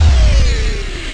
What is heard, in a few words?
Dearm; HangSim; beach